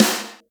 acoustic snare g sharp key, Loudest frequency: 2936Hz; snare drum